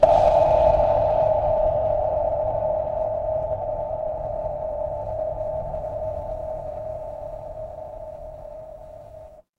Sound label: horror ambience dark